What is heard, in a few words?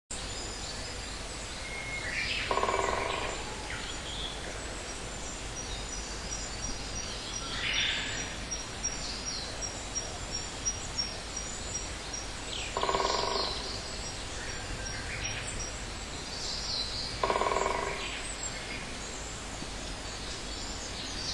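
great woodpecker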